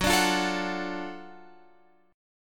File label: Gb Minor Major 7th Sharp 5th Gb, down, Guitar, Open F; strummed